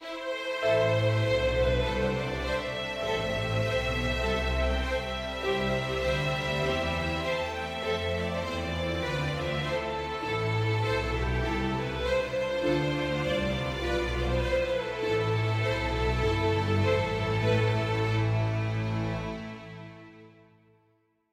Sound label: adventure forte